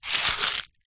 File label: sweet wrappers scoop up wood surface by hand